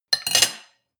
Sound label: cutlery on plate place; soundpool